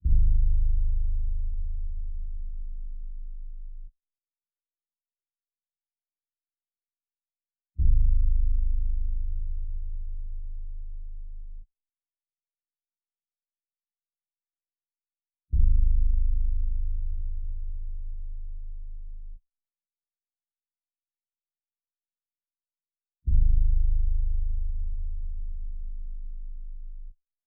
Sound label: 124 BPM G# Min Bass, Loop Stems; Melody; Cymatics; Cymatics - 2022 Melody USB Expansion; Vandal